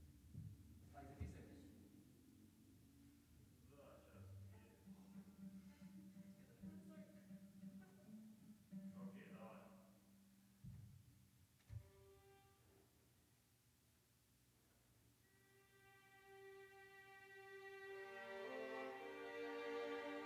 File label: Piano